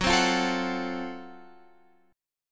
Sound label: Gb Minor Major 7th Flat 5th, Guitar, Open F, chord; strummed